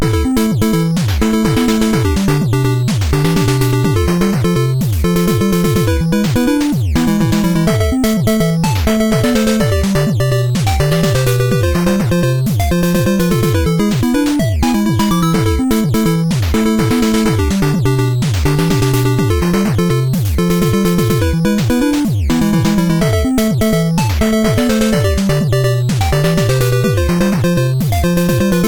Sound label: chiptune; tuxtype